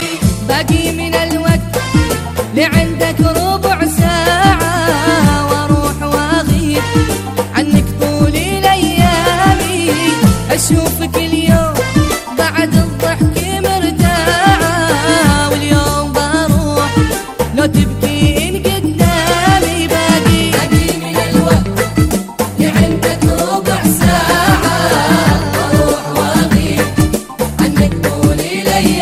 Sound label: Khaliji